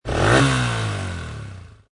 Motorcycle Dirt Bike Rev, Motorcycle motor revving; Motorbike type offroad Dirt Bike, motocross. Rev engine